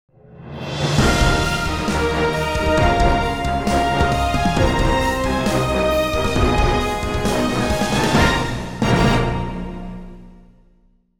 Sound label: you got a star; You Got A Star - スターをゲットした時に流れそうなジングル